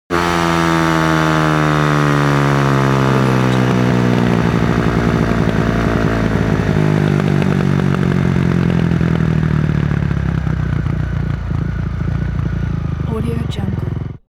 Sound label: transportation motorcycle motorcross decelerate